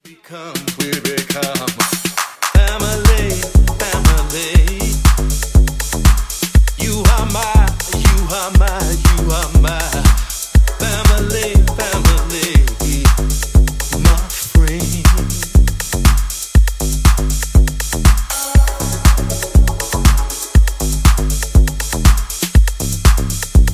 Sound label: Vox Dub